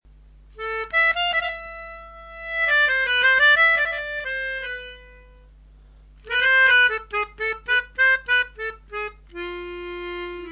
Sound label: forest harmonica